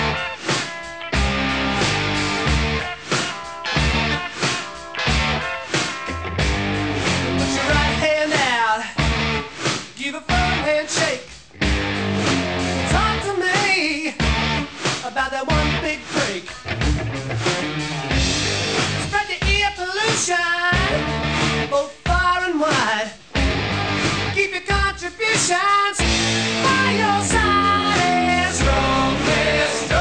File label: rock